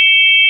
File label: sine; ものづくり基礎工学（情報工学分野）; 音の波形と分析